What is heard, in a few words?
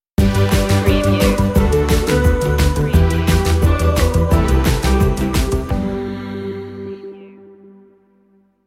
stinger